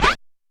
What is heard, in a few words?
U220 FX Set, Drum Machines; Roland U220